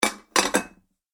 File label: Medium Side Plates Stacked Together; Dishware Sound Effects; Household; Andrew Fleming